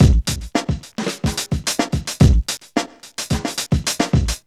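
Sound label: 109 bpm, Funky loops; Zero-G; Funky Elements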